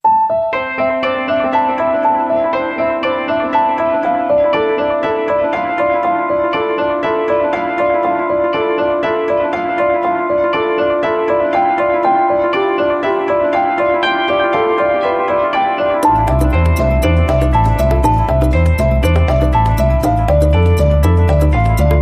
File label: Percussion Bed